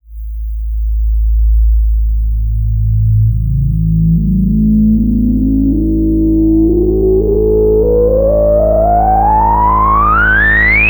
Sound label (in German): MOOG Filterdurchfahrt; Mavis